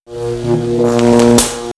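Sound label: Magnetic Field, electronic fx; X-Static Goldmine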